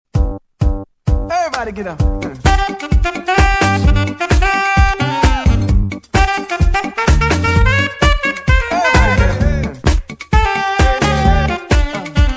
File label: Sax; Charts mit Sax Beispiel